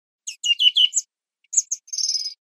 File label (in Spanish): primaveras, verderon